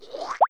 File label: drop; gcompris; babymatch